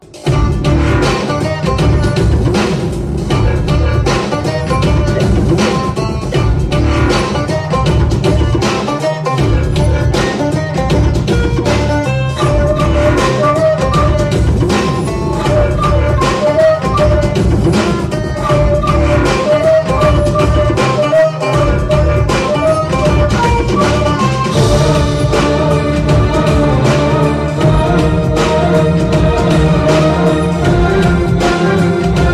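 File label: background music; Chiranjeevi; Acharya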